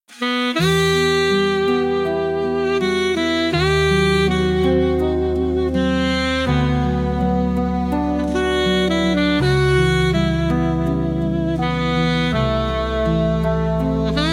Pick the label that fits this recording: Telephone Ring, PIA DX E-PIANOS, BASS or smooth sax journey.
smooth sax journey